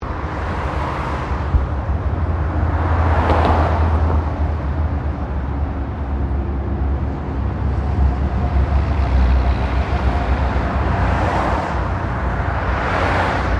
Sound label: Traffic Cars Freeway Pass By; General traffic sounds; Traffic and Cars